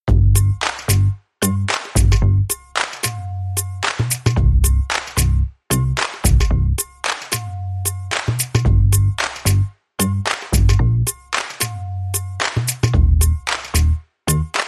Drum n Bass

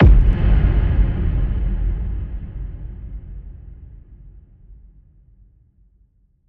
One Shot Effect Stomp

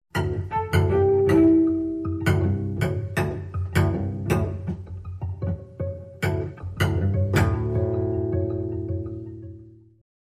Cello Suite 2 Variation 3

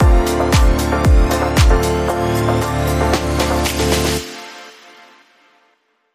Bumper

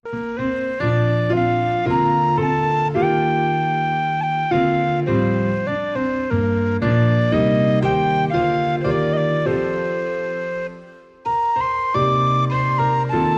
Hymn

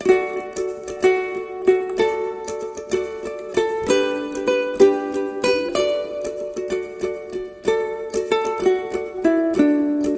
O Holy Night Ukulele

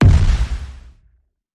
Hit Low Collapse

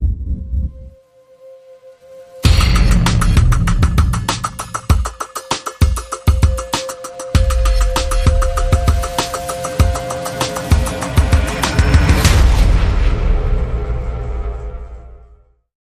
Drums and SFX

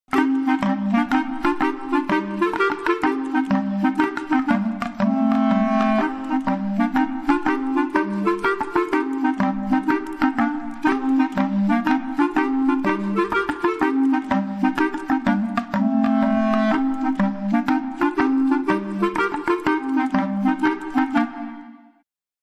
Ballad

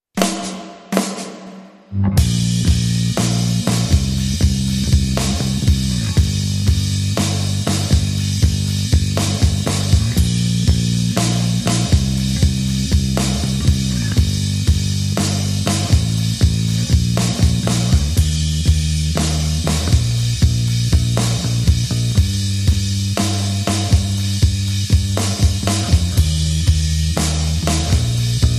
Drums and Bass Mix

